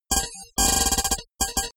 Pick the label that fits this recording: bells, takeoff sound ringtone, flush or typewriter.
typewriter